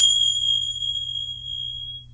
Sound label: finger cymbals; TamTamMicro